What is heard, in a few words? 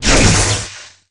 shock; minecraft; technic